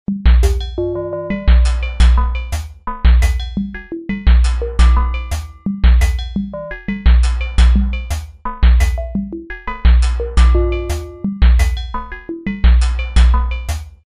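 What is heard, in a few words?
kit pattern 86bpm 5bars, Chromatic hits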